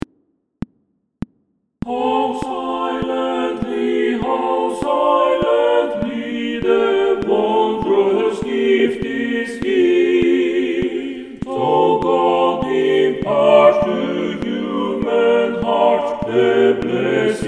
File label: TUTTI click; Oh Little town of bethlehem